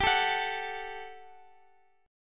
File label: G Major 7th Suspended 2nd G down Guitar Open F; GM7sus2; strummed